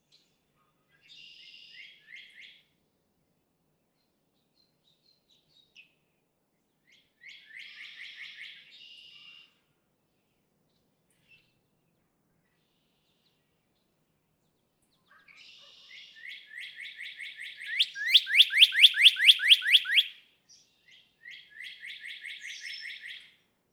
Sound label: Northern Cardinal